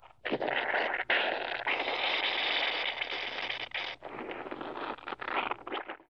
Steam Bubble, ambience

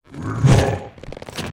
BLAZE BLOCK WAR ATTACK

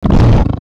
war damage; DAGAN; Mob

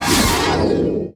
VOLCANIC LAVA WAR ATTACK

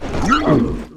Damage; Eldcrochi; Mob

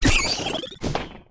THORNASH WAR DIE

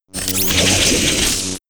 BLADE WAR ATTACK; Mob

